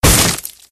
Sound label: Body Crash; Friday the 13th: Killer Puzzle